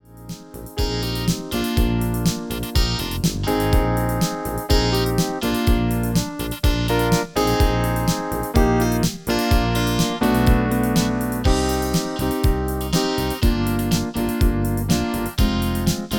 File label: MIDI